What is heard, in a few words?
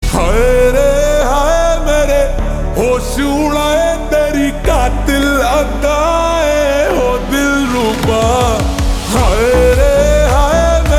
Slowed Reverb; Raid 2